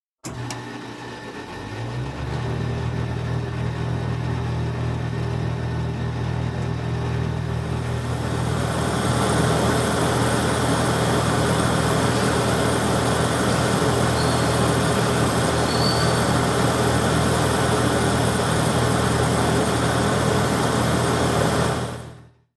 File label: Welding Machine Start and Weld